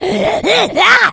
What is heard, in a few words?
rage, infected, female